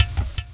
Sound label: bounce, weapons; cstrike